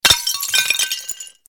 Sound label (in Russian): Звуки бьющегося стекла посуды бутылок окон